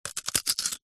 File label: human eat bite potato raw single